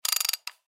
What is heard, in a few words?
Ratchet Snap On Half Inch Big Dog Turn Crank; Power Tool; Power Tools; Industry; Sound Effects:Tools; Blastwave